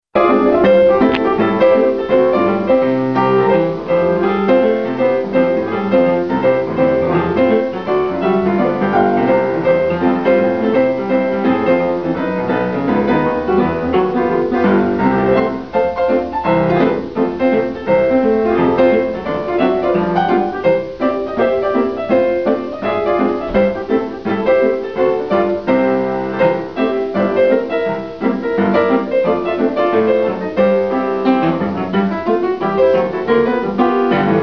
Fox Trot; A smooth progressive dance characterized by long, continuous flowing movements across the

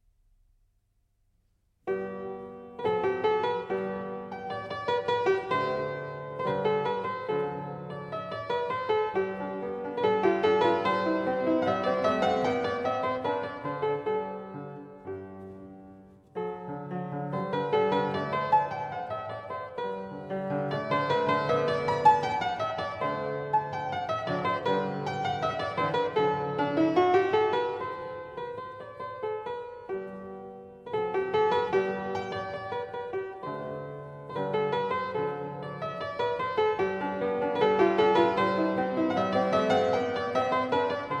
Sonatina in G-dur - Moderato; Beethoven; Sonata

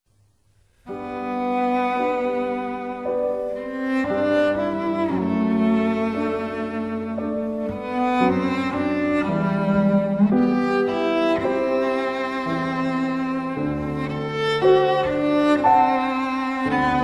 Arioso - Adagio; Bach; Arioso from Cantata BWV 156; Opera

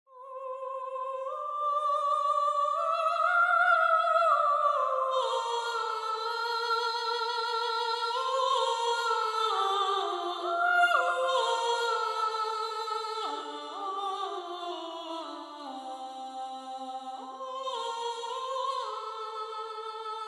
counterpoint

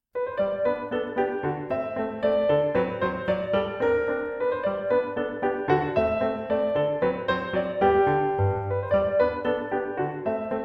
ecossaise; beethoven; ecossaise 23

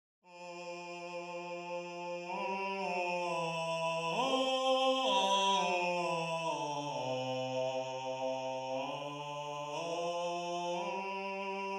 Bass

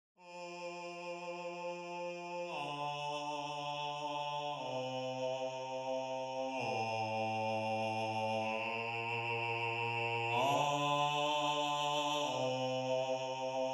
Bass

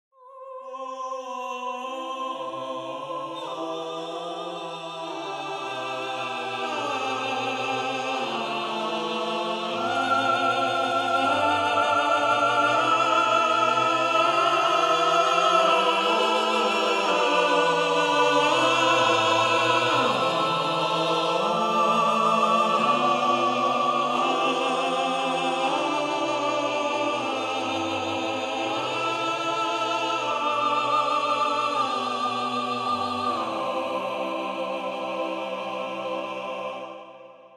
counterpoint

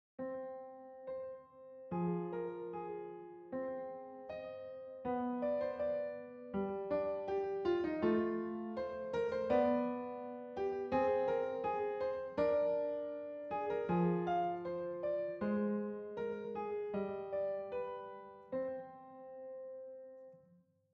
Piano

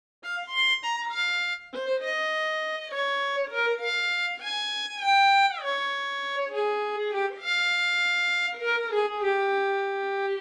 double stop

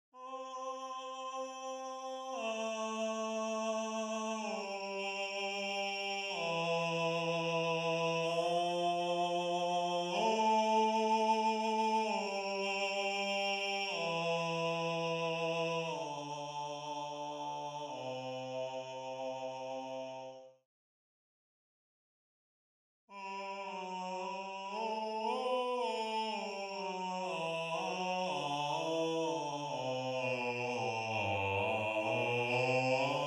Bass

